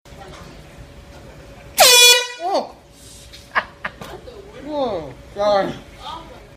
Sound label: air horn in subway; vine; comedy